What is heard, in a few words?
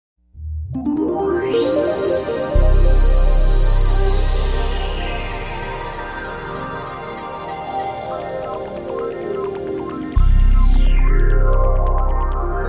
Ambi Info Pad